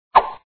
Swing; Dad 'n Me

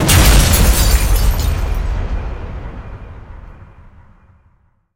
Car Explosion

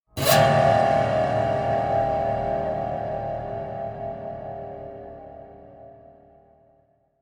Piano Eerie Stinger; Layers of Fear Inheritance

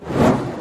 fireball sound; Arc of Templar

